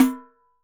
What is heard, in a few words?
Pandeiro open; Best Service; Festa Latina VOL-1; Single-Hits